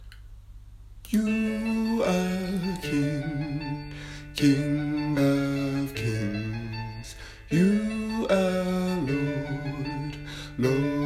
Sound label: VOICE